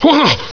hurt

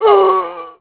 zombie pain, male

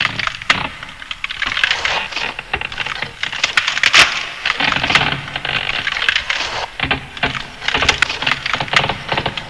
ice breaking

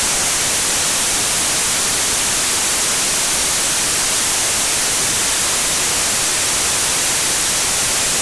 tv noise

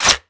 m203 slide in

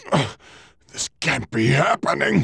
death; vegeta

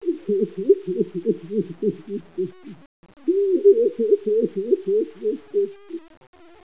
gay cry

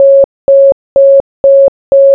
morse; ragemap2024

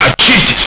sweep

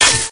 razor hit, weapons; scmod; ut99

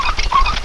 wheels; ambience

